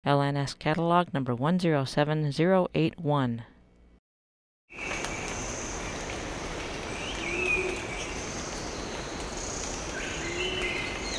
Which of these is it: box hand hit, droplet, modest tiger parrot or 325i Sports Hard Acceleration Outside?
modest tiger parrot